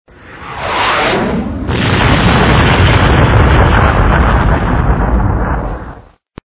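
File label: projectile flies past and explodes with a crash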